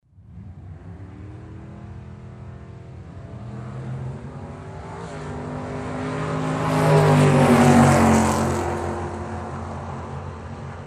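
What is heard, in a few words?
sports car passing nearby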